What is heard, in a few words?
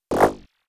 Hi spinner